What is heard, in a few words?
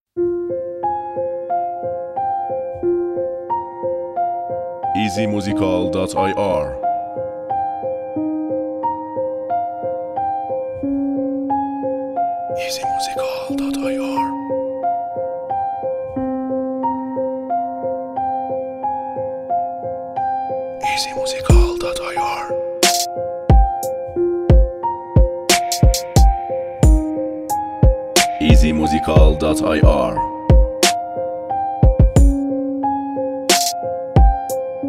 Beat